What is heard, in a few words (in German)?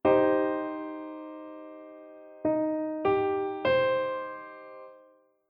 Dreiklang Moll Umkehrung